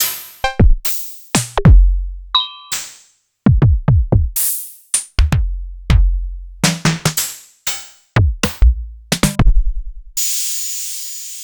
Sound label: Yamaha FS1R; Sliced